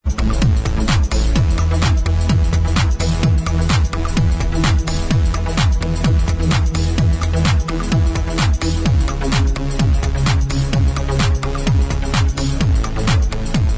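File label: dance remix, Instrumental Track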